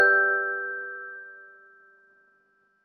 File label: complete